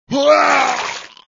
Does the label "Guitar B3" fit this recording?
No